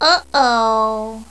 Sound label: UHOH; HUMAN